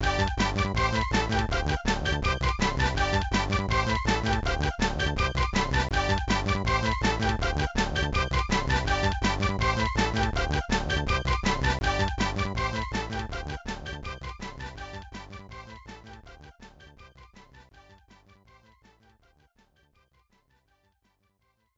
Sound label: Bonus Game BGM, arcade; Vs. Super Captain Flag